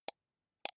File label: computer mouse wheel click slow soft